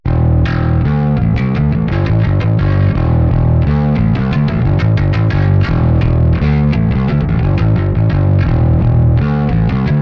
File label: bass drive finger line